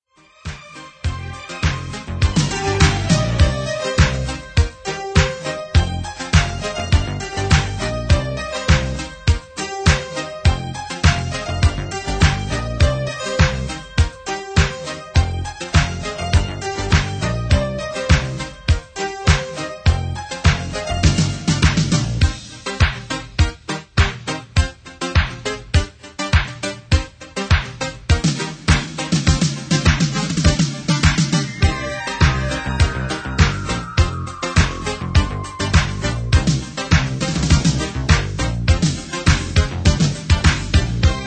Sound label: Piano Fantasia